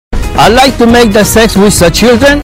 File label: children loud